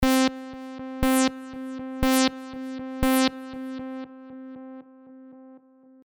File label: delay FB L